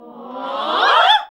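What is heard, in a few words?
classical singing; Sound & Vision - Gigapack II CD 1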